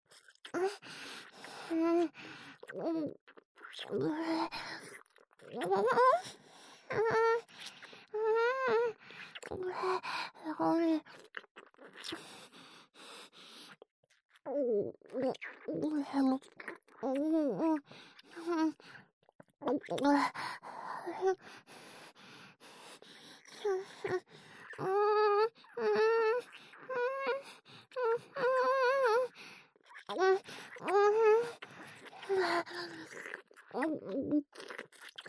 ディープキス; ディープキスレイプ（華恋）; キスループ; おまけループ（オナサポ）

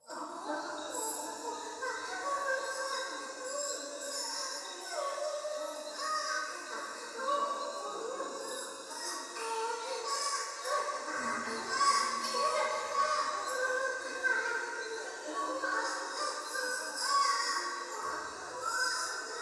トイレ 低音喘ぎ 山奥夜

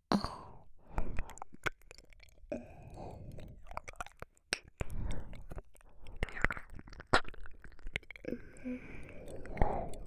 耳舐め, ASMR; SEなし

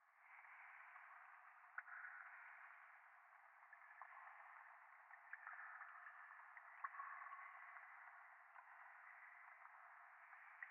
ゴースト 左耳密着吐息 雨上がり

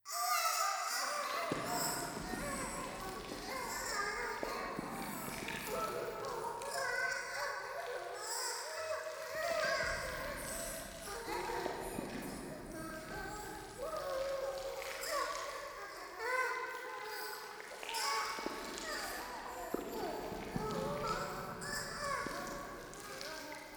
シャブ喘ぎ 触手

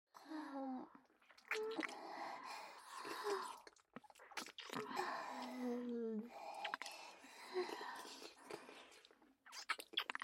両乳首舐めループ

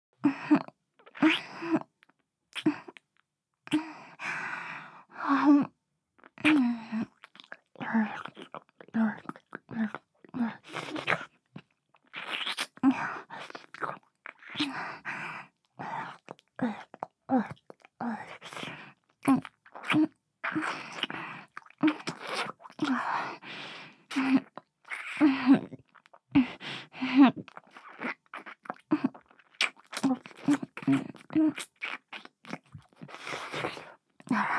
キスループ

